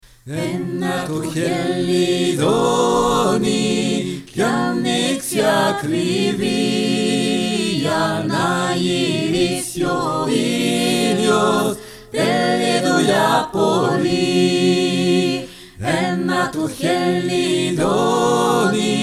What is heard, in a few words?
tutti; Ena To Helidoni